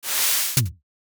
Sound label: slide paper